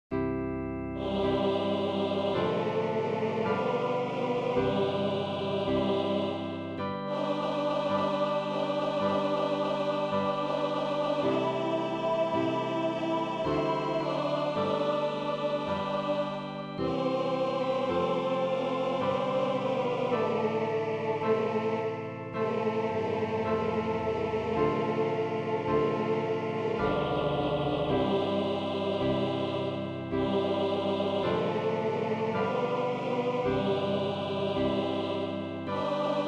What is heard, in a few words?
Gloria Tenor; Messe de Verrieres; Gloria Ut M; Verset 2 et suivants